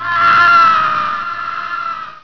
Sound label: scream